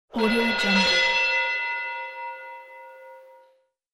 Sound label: distant celebration triple gong